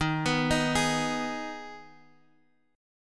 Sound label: D sharp Suspended 4th Sharp 5th D sharp; arpeggiated; chord; 23,26,25,25; JGuitar